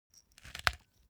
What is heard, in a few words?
object kitchen small pepper grinder pressured click ring